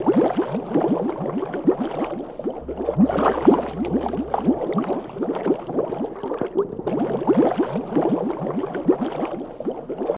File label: Bubbling Water Loop